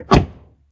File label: close; block